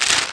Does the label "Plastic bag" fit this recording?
Yes